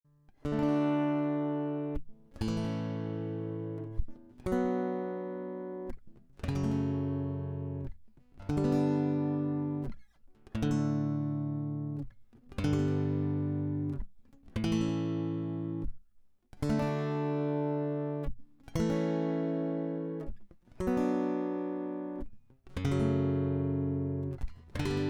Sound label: Chipped Baroque